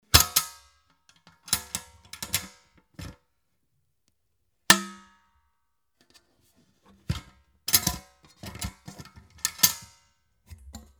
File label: bucket